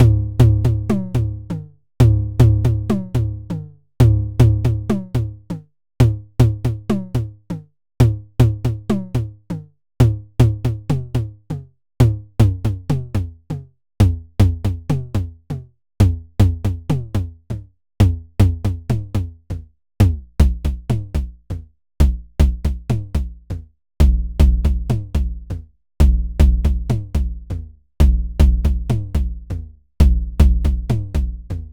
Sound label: Toms